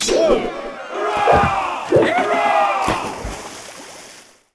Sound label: duel outro amb lose; Sid Meiers Pirates; XBOX